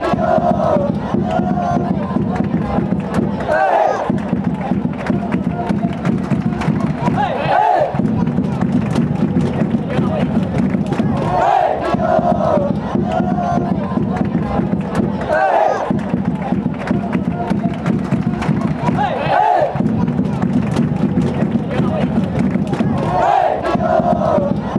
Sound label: eh eh, fan chants